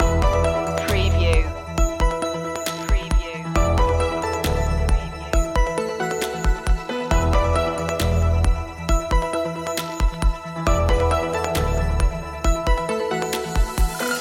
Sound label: digital environment loop